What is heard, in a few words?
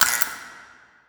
light flicker; Portal 2